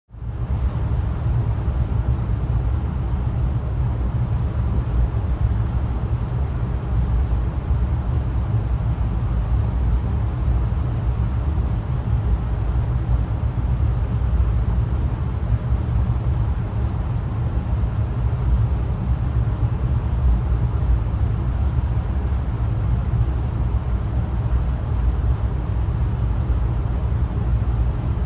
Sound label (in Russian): korabl gul bolshogo korablya zapis v salone